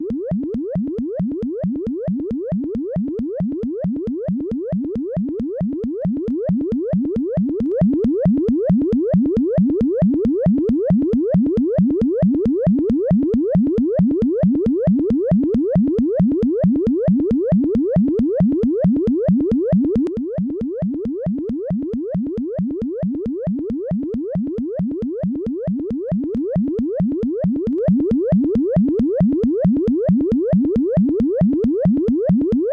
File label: techno